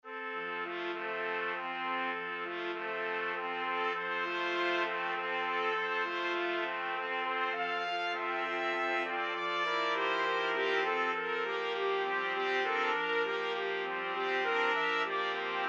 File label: lullaby, 5 trumpet